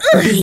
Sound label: voice female hurt pain